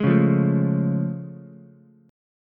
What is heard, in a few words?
A Augmented Major 7th A, Ukulele; strummed